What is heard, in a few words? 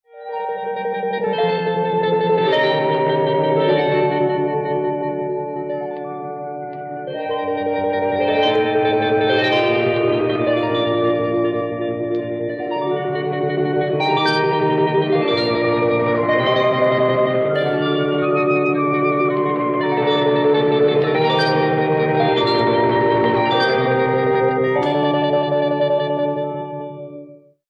keys and flute sad mariache band; Loops